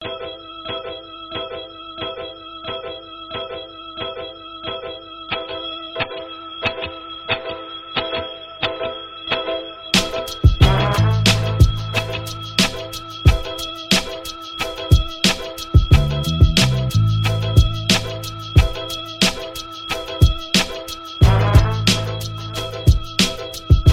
beat

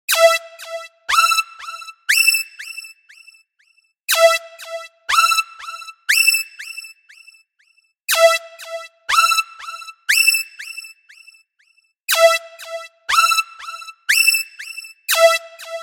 Ringtone; Zip Zap; LightWave Ringtones; Ringtone Records

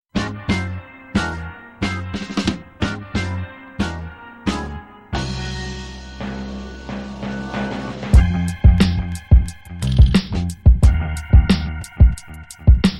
beat

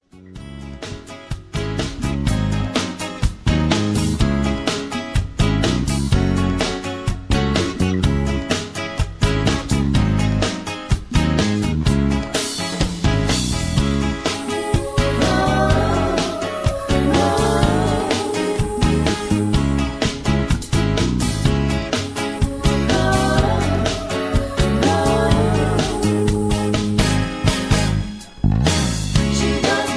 Key C Sharp Minor, Karaoke Track

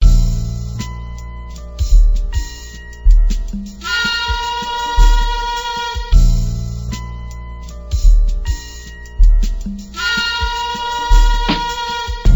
beat

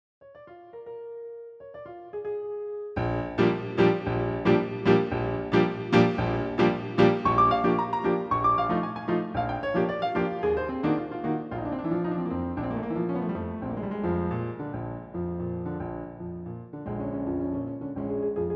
Preludes for Piano; Gershwin

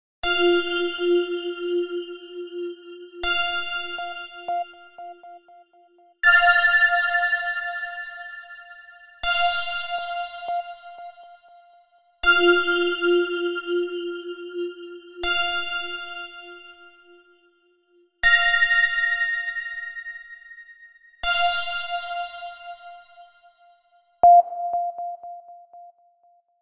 Soft Beeps Ringtone; Ringtone Records; LightWave Ringtones

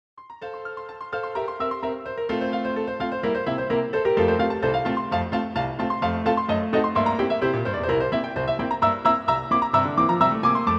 Clarinet Polka

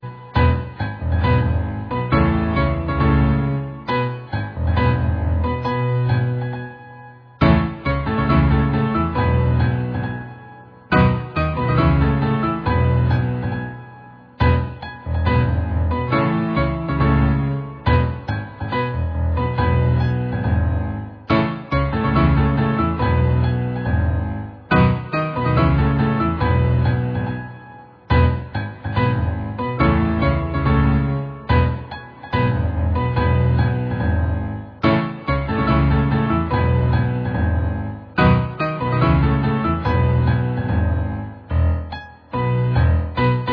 Jangly Piano

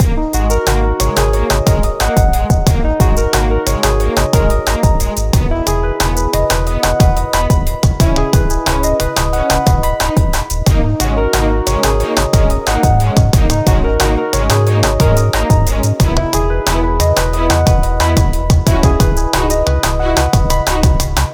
Full Groove, loops r&b; prime loops r&b

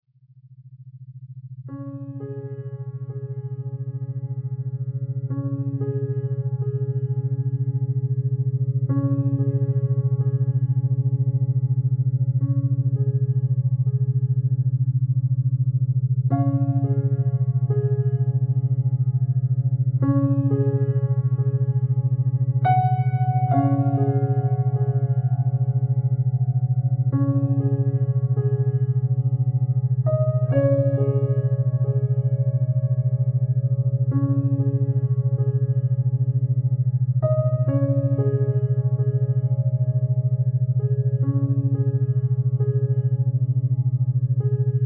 healing piano, delta brainwave entrainment; PIANO MEDITATION MUSIC; HEALING WAVES Delta Session

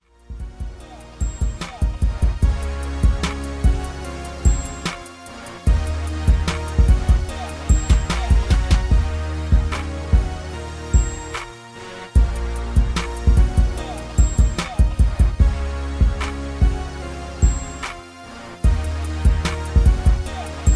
74BPM

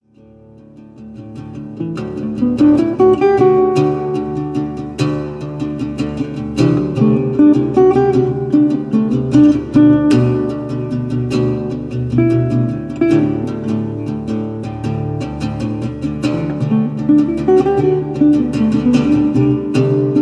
Dobro Duet

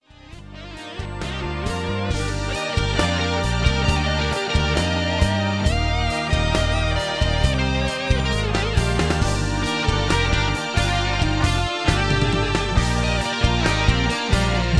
Solo Guitar Track in Cm; Solo Giuitar in C Minor

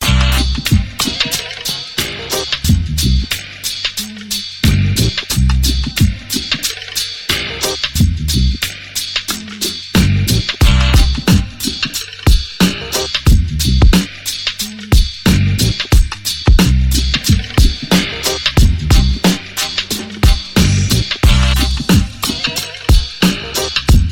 beat

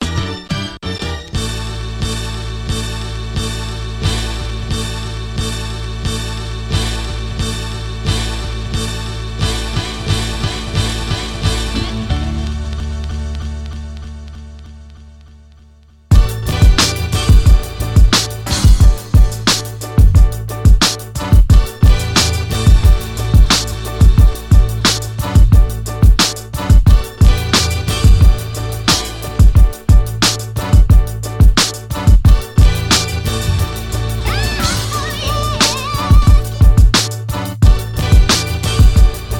beat

